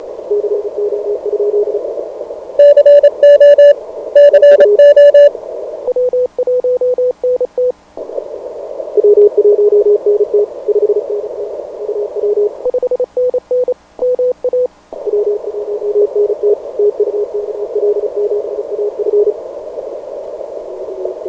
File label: alaska 40m cw; 2009 arrl cw